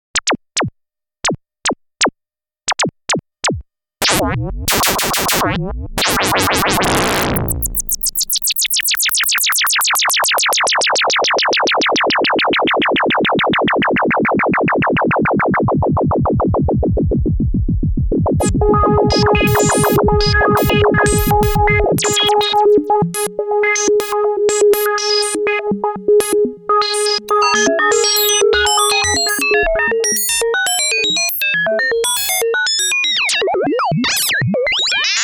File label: synth fx; mopho demos